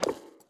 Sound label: menu tabs move, user interface; gmod; mw; iw8